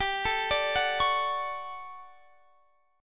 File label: D Major 7th, G; arpeggiated; DM7/G; chord